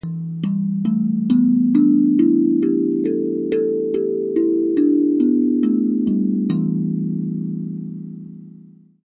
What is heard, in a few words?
Hapi Drum; Perc-melodisch